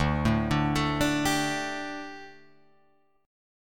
D Suspended 4th D, Guitar, Drop D

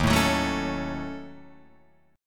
D sharp Minor 7th Flat 5th down Guitar Open F; strummed

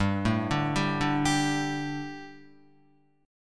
G Suspended 2nd G down Guitar; Gsus2 arpeggiated

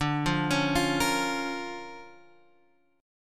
Bb Minor, D; arpeggiated; chord shape

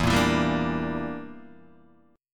E Minor 7th Flat 5th E, Guitar DADGAD

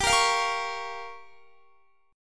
G Minor 7th Flat 5th, Guitar; Gm7b5; strummed; x,22,20,22,22,20

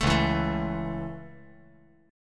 C sharp Minor Sharp 5th C sharp, chord; C#m#5 strummed; JGuitar

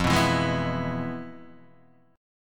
F Minor Major 7th Flat 5th; FmM7b5 Chord {1 2 2 1 0 0} strummed

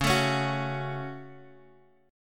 C sharp Suspended 4th Sharp 5th C sharp, chord; C#sus4#5 Chord {X 4 7 6 7 X} strummed

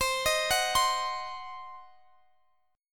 C Diminished, Guitar, DADGAD

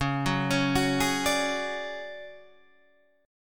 D Major 7th Flat 5th C sharp, chord

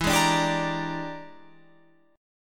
E 7th Sharp 9th Flat 5th Guitar Open F, chord; E7#9b5 strummed; JGuitar

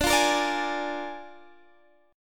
Bb 7th D, chord

